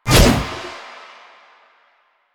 Stalker Scythe Spin Whoosh; Weapons; Warframe

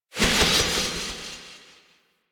Impale Cast Release Tail; Warframe; Warframes; Hoplite

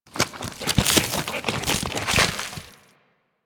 Juggernaut Truffle Dig Foley; Warframe; Infested; Truffle Hunt

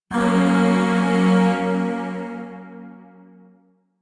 Shawzin Yo Chord F; Music; Chords; Warframe; Zariman Void Shawzin; DE

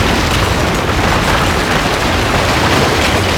Shipyards Rock Drill Debris Loop; Ambience; Props; Warframe; Grineer Shipyards

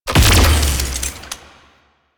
Shot Heavy, Weapons; Warframe; Archwing; Larkspur Prime

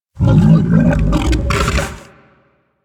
Helminth Idle Stomach Rumble; Warframe; Hub; Liset Alchemy Lab

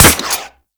Harpoon Gun Shot 3D; Warframe; Sharkwing; Grineer

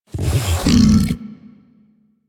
Vocal Breathe Fast; Warframe; Hunting Critters; Undazoa

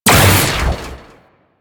Metronome; Warframe; Amalgam Moa; Abilities

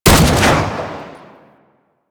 Sniper Rifle Shot; Warframe; Tenno

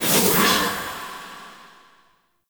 Ward Cast Stone Accent; Powers; Fey; Founders

